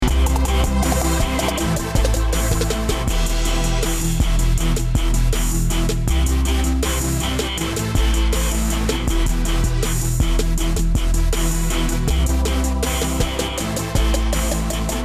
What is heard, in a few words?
remix; SFX Royal; polygoniix Intro Essentials pt2